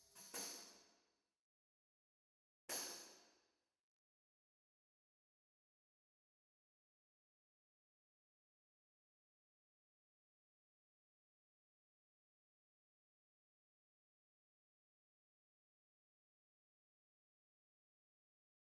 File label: Tambourines